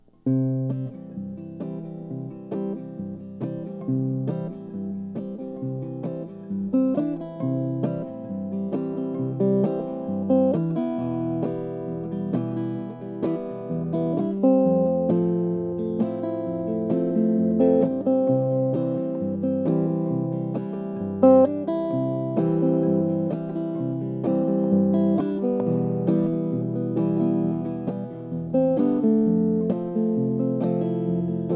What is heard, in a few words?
vega d46 duo tron; 1848; archtop; sunburst